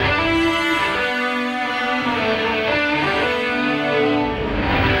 Zing Zing, Electric Guitar; Sonic Foundry (Sony Creative Software); Friendly Fire; Wrath

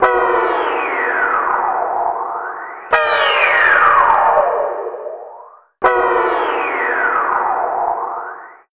Chorum Slidum 165, Guitars; Total Drum&Bass

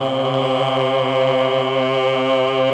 choir + flute, Mellotron, c2; Retro Sampler

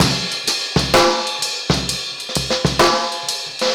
Slow 'n' Tasty, Drum Loops Jazzy; Zero-G Smoke

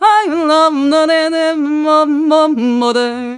VOICE, Balkanish Traditions; USB Soundscan vol.63; AKAI

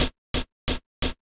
DRMSND, Underground Hip Hop, BEATKIT

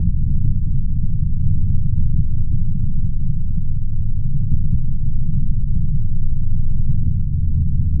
Drone Ambient; Drums & Drones

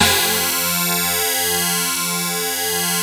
SYNCLAVIER; DIGI2 FM+SMP

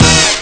end blaster; ST-XX Amiga Soundtracker Sample Packs

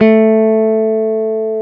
GTR, Dual Guitar; Club-50 - Foundations Roland

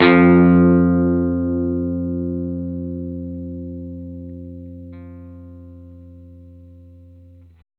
NOTE F, Vintage Blues Guitar; AKAI; USB Soundscan vol.22

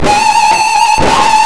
SCREAM guitar; GUITARS KIT

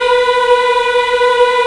African Mm f; Roland LCDP11 Africa VOL-1

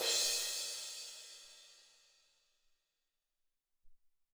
Amb Crs PP, Alesis SR-18

